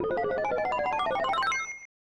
Star Fanfare; Do Re Mi Fantasy: Milon no Dokidoki Daibouken

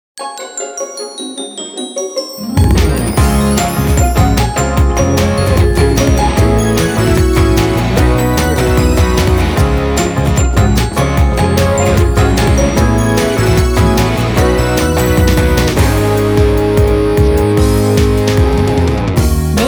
Days half chorus; Natsupochi

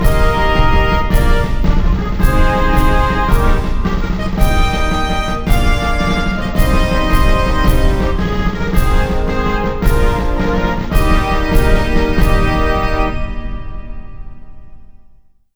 Anthem Portugues

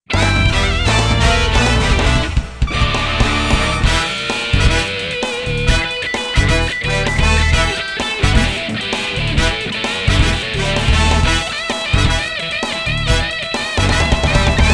Battle; Legendz Gekitou Saga

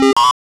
Apple Breaks

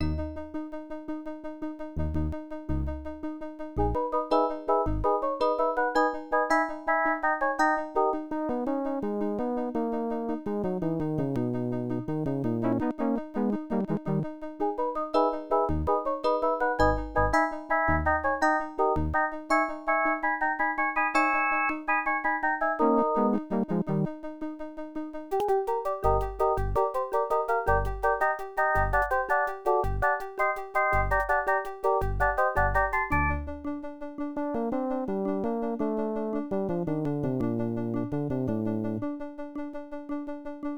Passing Checkpoints (The Motorcycle Chase)

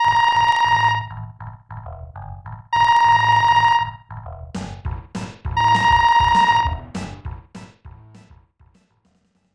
Phone Call; Hisshou 777 Fighter II; SNES

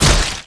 gib zombie

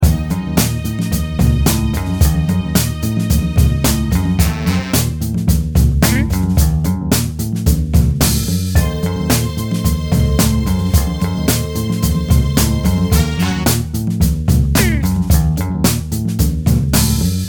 Win Game; Kings of Kung Fu

